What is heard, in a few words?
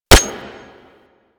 Mech, Weapons; Lato Vandal